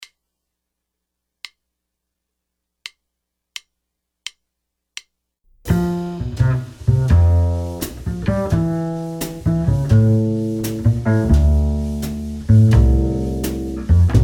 backing track

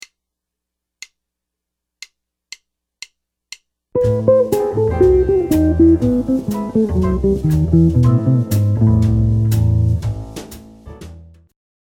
arpeggio approach notes, jazz guitar

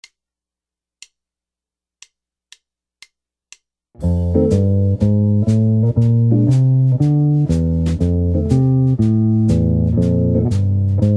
walking bass chords

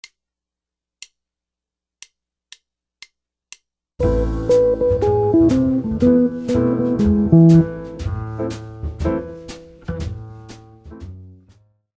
melodic minor licks, guitar

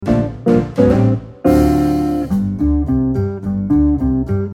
break, jazz guitar

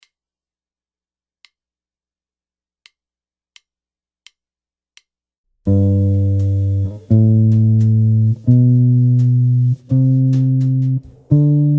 diatonic chords, jazz guitar

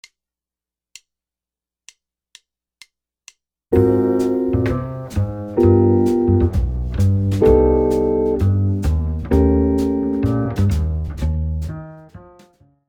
jazz guitar comping

